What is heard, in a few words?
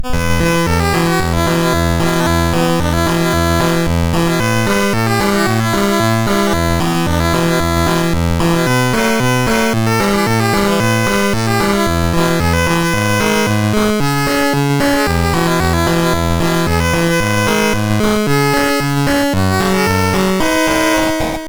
Trainer, MOS6581R3